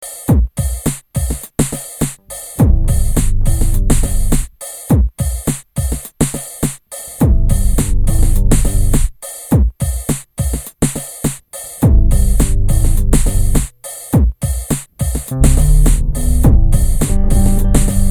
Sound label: Cello Fake